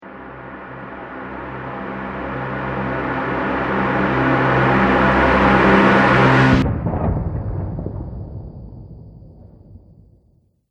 Radio Stinger

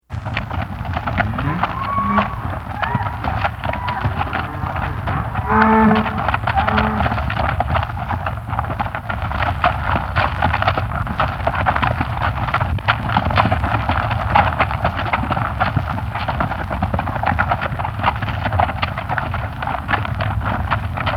Cattle Trudging Calling; Cows; Animals

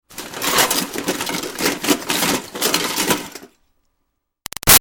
Foley Rummaging Junk Debris Busy, metal; Clangs; Materials

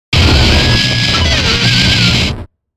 Distorted Guitar Static Chaos; Distortion; 5.1 STEREO MIX; Blastwave

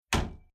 Household Door Old Spherical Latch Closed Quick

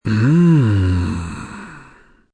"Mmm" Pleasure, male; Reaction

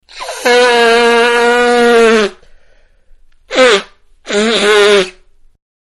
Blow Nose; Sick (Sound Effects:Human); Manhattan Production Music

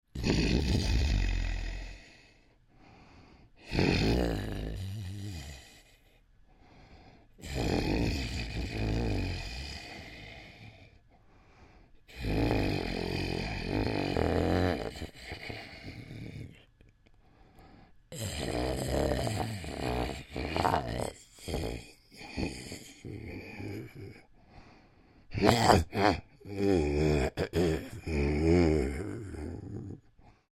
Creature Troll Exhales Resting; Monsters; Horror; Big Room Sound

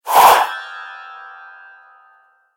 Chime Whoosh; Sound Design; Whoosh Sound Effects

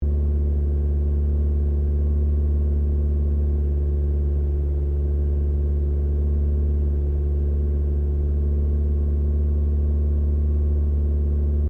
Car Ford Focus Idle 2000 RPM Interior; Ford Focus hire car; Cars (Sound Effects:Vehicles)

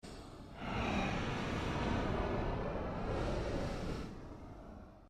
Created Cyber Whale Call, Alien Sound Effects

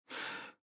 Breathe In Element Male 25 Yrs; Breathing; Human; Blastwave

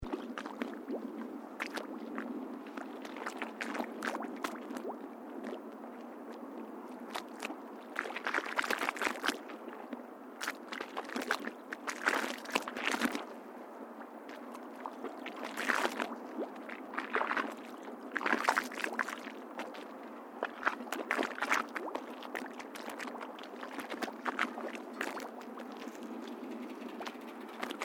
Water Waves Against Concrete Wharf Loop; Elemental weather effects; Ocean Sound Effects; Ocean (Sound Effects:Nature)

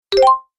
Gameplay Bonus, video game

